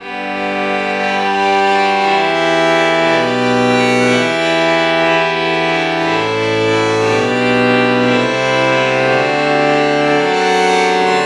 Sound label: violin violin lpc true bach morph